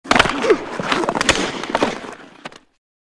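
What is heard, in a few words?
Hockey Player Fight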